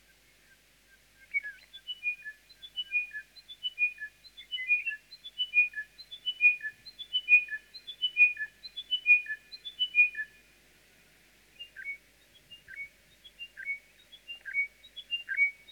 chiming wedgebill; Australian

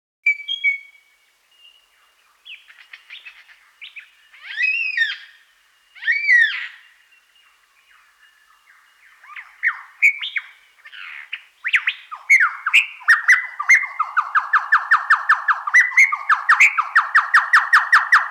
superb lyrebird

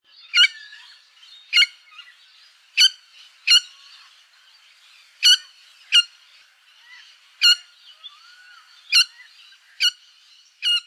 silver crowned friarbird

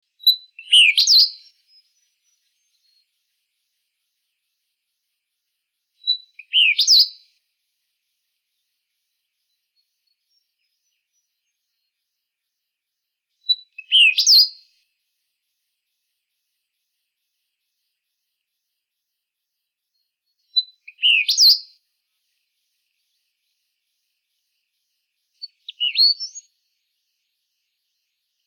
eastern bristlebird

